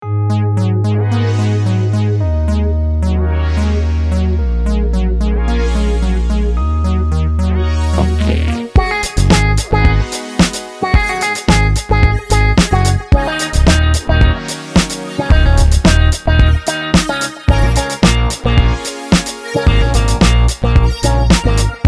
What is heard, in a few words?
VOCODER FUNK